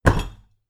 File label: empire game settlement ui item placement set down deep impact; heavy